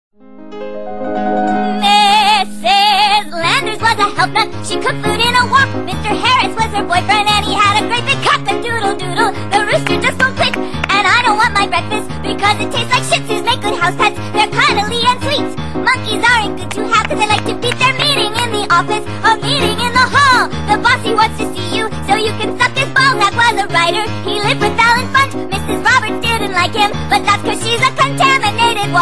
funny melody message

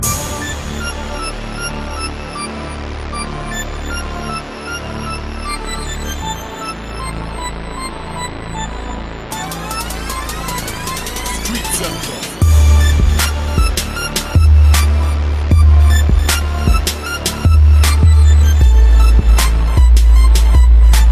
hip hop instrumental

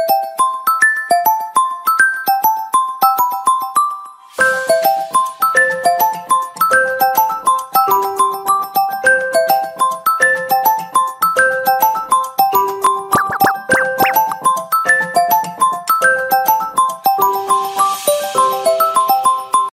Miui Celesta

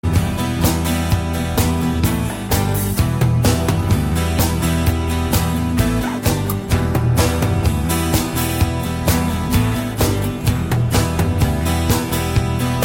melody

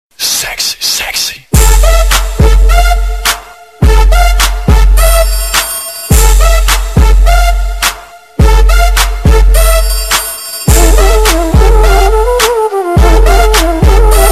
hip hop

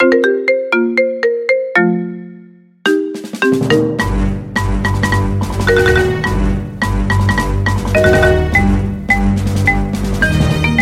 imperial marimba

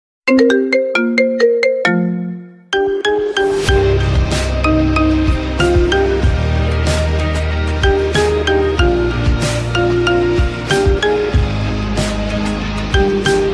Wrecked Marimba

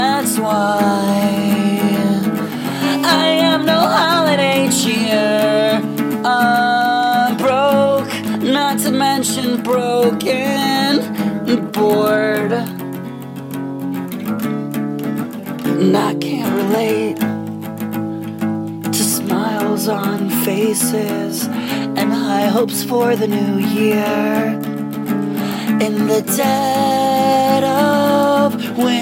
holiday cheer

